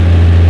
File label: semi, Cars; XBOX